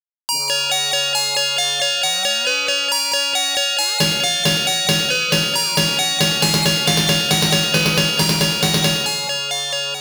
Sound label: Mission Accomplished (Clear)